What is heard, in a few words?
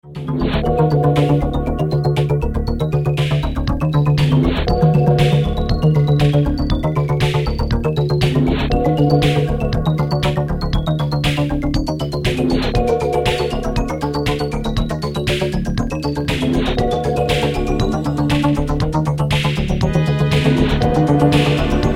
Digitally controlled oscillator